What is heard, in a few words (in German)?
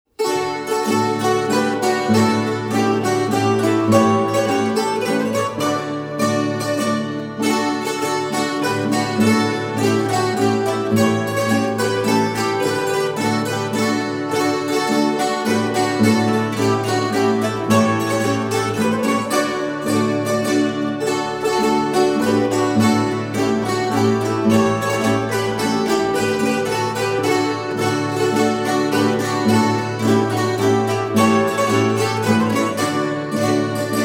Harzzitherspiel Unterhaltungsstückchen